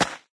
step dance; taunt; player; yeti standee; engineer; source engine; tf

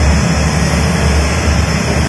machine, ambient machines; Source Engine; cstrike

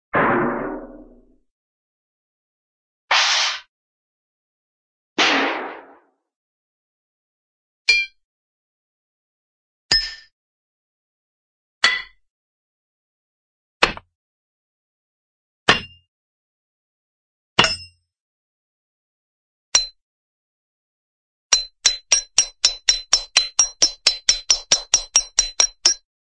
hit, metal metal safe hit; cartoon

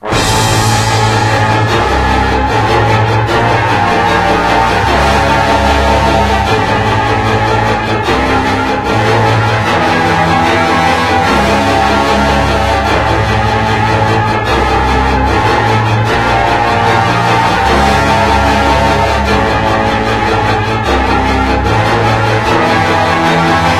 vassalation, music; Left 4 Dead 2; Source Engine; video games

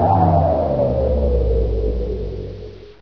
machine off; Source Engine; bshift

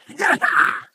splits; Portal 2; Source Engine; video games; ballbot

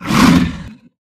taunt yeti roar beginning; video games; source engine; tf

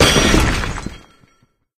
drop; taunt; soviet strongarm; source engine; tf

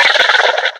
box monster chitter

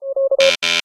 download failed; replay

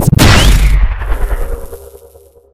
energy disintegrate, physcannon; Source Engine; video game; weapons

